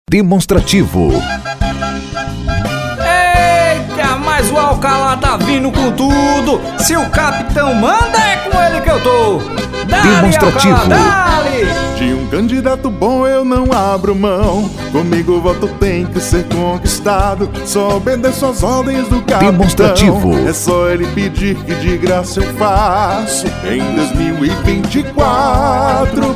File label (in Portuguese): JINGLE; ALCALA